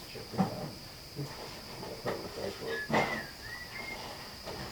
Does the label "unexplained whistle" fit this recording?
Yes